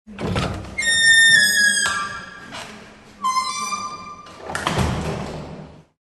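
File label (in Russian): звуки дверей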